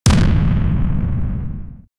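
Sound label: rocket missile launch sound, weapons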